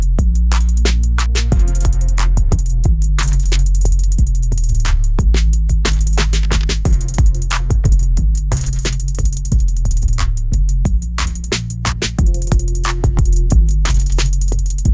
retro shadows hip hop beat; ai

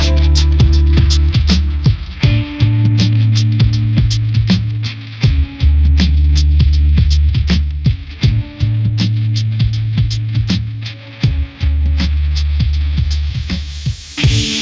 mellow drive rock beat, ringtones

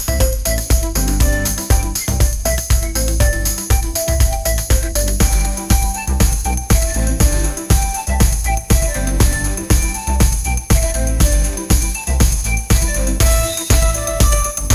bright horizons pop anthem; ai

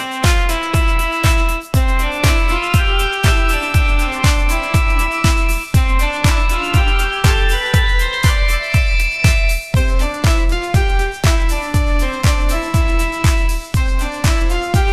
bright synth pop groove, ringtones

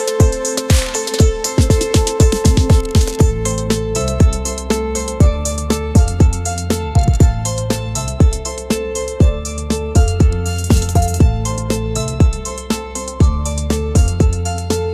bright pop ringtone groove

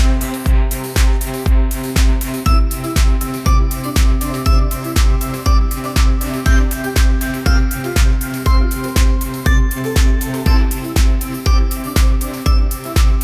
epic pop anthem rising; ai

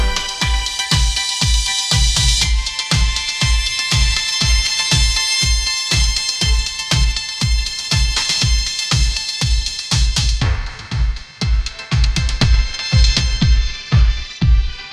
epic pop anthem rising

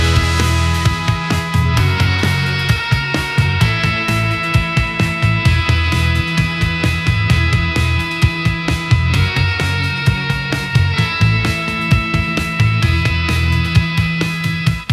anthemic rock; ai

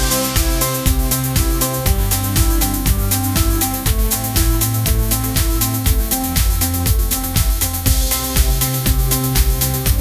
hello sunshine pop groove, ringtones

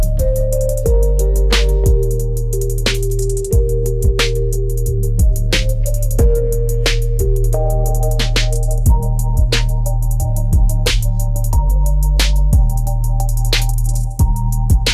trap beat

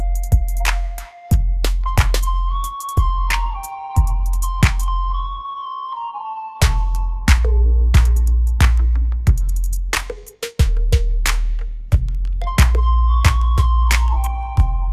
trap beat; ai-generated

